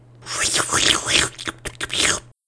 slurp; xash3d fwgs; induction

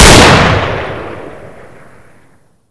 player scream; xash3d fwgs

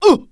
damage; mohaa; dialogue

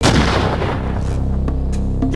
death; singe

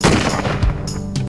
death; singe

